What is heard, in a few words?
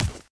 ghoul foot; arkane; monsters; footsteps